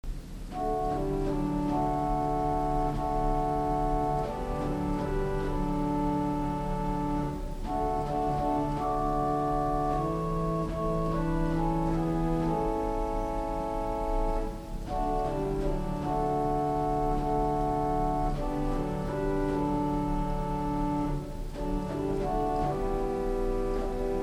Organ Swell Nason Gedeckt 8

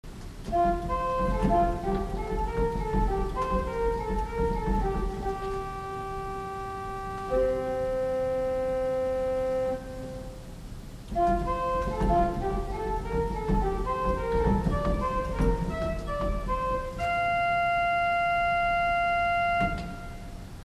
Organ Pedal Octave 4

